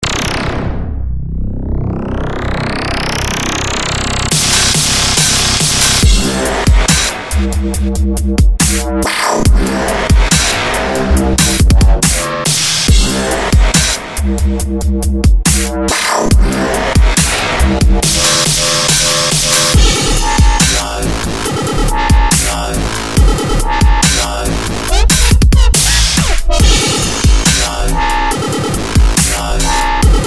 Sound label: fm z3ta+2 dubstep